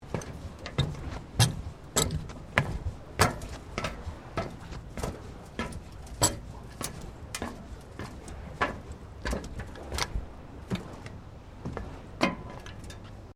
FOOTSTEPS SNEAKERS METAL PIER SEQUENCE, Walking on a metal pier; Footsteps (Sound Effects:Human)